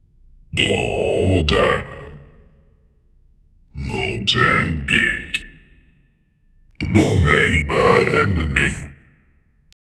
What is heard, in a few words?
game character sound, demon